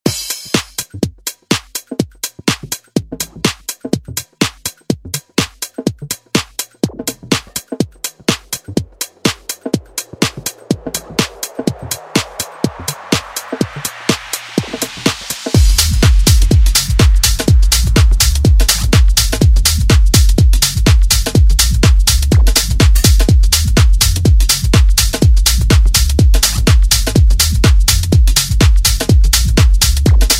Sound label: Tech House; Deejay Hit